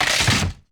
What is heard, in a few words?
Cannon Hurt Sound; War Machine